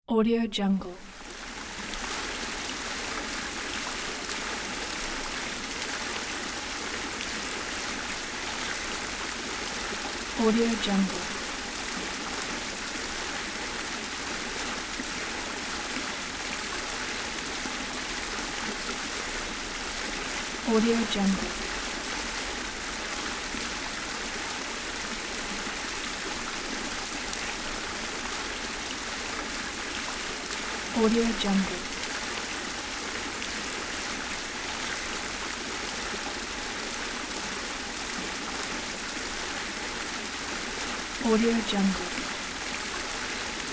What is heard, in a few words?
flowing water